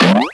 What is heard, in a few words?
Cartoon; 特酷声音素材库